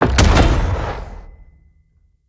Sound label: Siege Engine Cannon Fire; Weapons; Enemy; Artillery; Ode Siege Engine